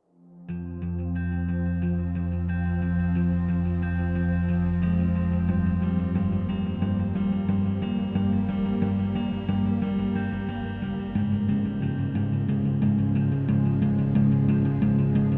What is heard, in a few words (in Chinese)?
剧情叙事, 宝藏背景音乐